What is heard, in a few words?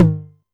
Tom Hi, Novation Drumstation 909